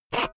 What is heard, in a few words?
saw use short quick